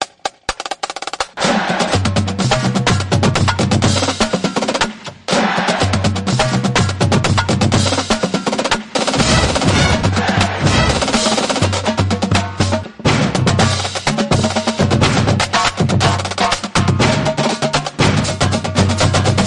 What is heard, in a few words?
Percuss U